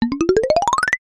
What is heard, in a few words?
cartoon climb fast steps ladder cute